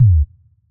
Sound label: electronic kick short; soundscrate